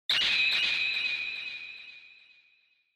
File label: Onoma Flash Low Delay; オノマトペ 光る; 低 ディレイ